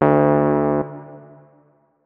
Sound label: notes, keyboard, electric piano